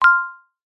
multimedia notification bell chime ping tone muted